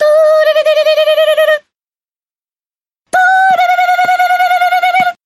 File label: Doppio ringtone